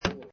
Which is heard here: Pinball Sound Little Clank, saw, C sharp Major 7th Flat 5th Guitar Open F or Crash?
Pinball Sound Little Clank